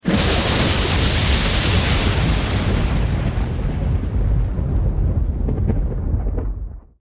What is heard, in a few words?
Lightning Alley Alert